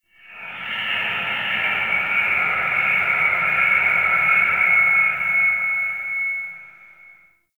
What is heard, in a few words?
Interior Stadium Feedback